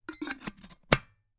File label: household kettle metal lid place on; zapsplat